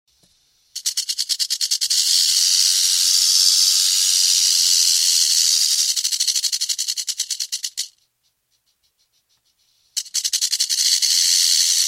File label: Rattlesnake rattling